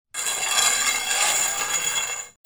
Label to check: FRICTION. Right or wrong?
Right